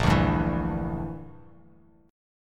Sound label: A sharp 6th Flat 5th, Bass Standard; A#6b5 strummed; JGuitar